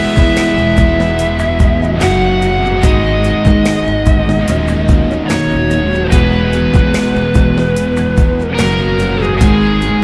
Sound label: Lead solo